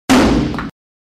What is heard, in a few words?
brick on metal sound effect